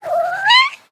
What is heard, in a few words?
Kitten Meow; SES Animals SFX